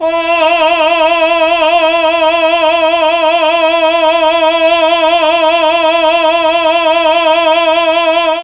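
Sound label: E3 chamber male, mellotron; CHMB MALE VC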